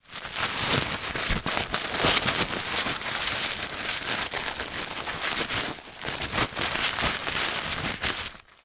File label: household disposable nappy movement in hand